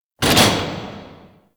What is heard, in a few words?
Rail Jack Ship Mech; BFG; Warframe; Vehicles; Rail Jack Weapons